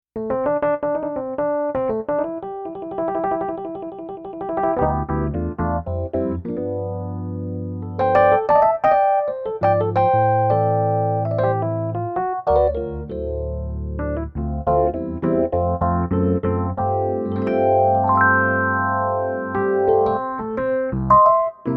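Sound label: tine electric piano; RD-800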